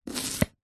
food courgette zucchini single chop knife wooden chopping board